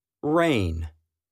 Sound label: rain